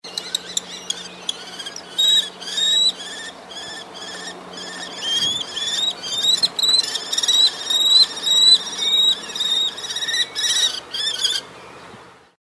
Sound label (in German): Turmfalkenpaar Balzruf